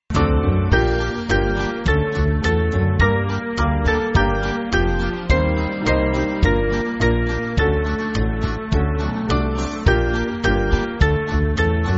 Band, church music; Rejoice Rejoice Believers; Llangloffan

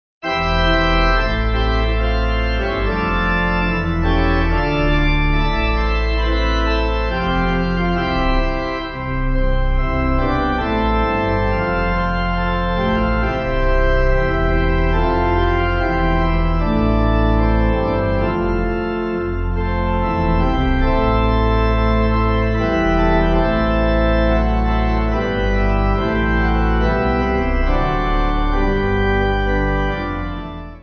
Pipe, Pipe Organ; Bring To The Lord; Jerusalem 8.8.8.8.D

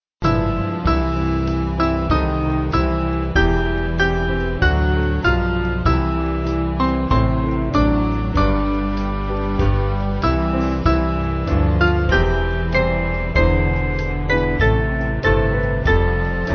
Band; Dear Lord And Father

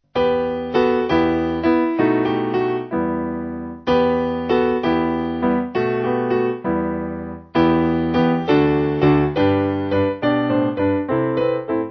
We Three Kings Of Orient, Piano; Simple Piano

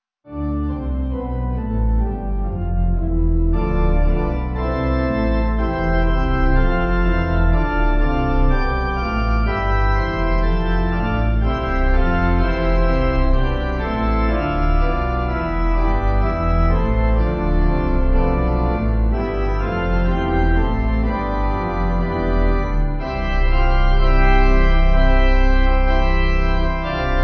Pipe, pipe organ

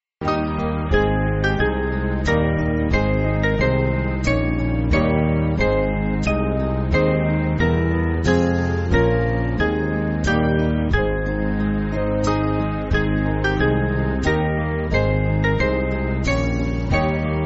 Band, church music

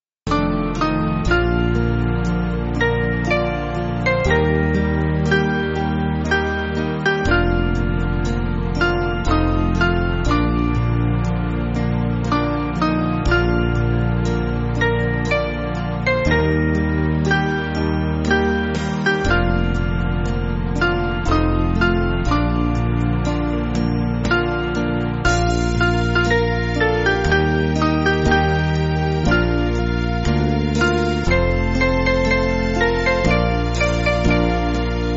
Band; Only Thing Will Be

